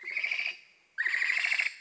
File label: out night; Mafie 1